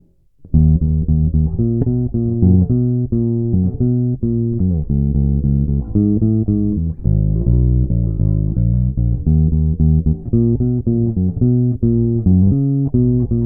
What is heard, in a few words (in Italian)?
PBA54 VS 70s Dita tono chiuso